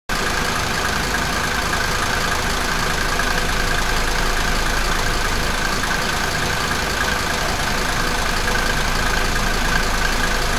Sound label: idle, rail